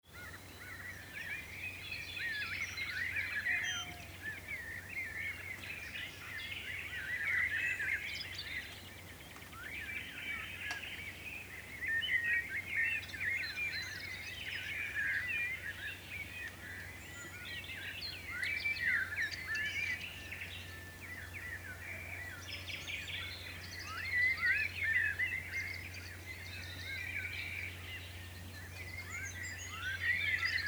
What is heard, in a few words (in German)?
vogel